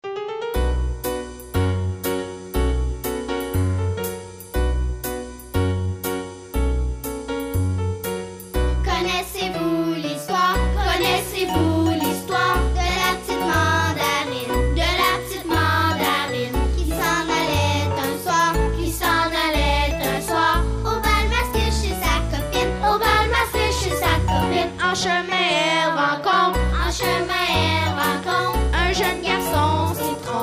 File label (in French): petite mandarine chant